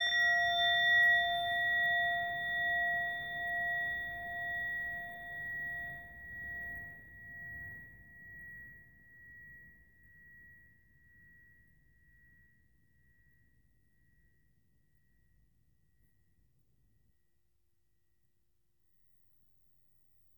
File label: Meditation Bell